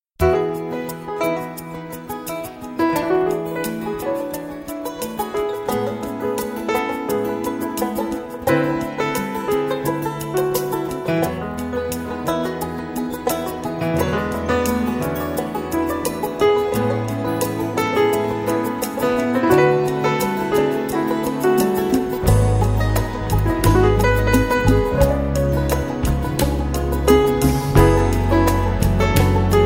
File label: Instrumental